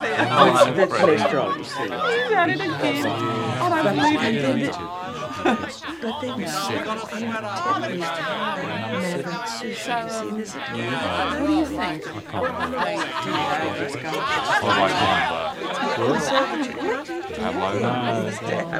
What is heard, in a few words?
Bar Walla, Lots Of Non Specific Talk